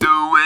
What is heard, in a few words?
"do it", Talk Box